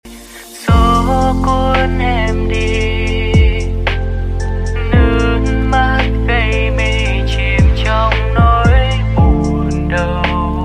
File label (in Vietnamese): Nhac Chuong Lofi